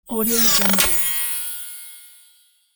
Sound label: christmas sparkles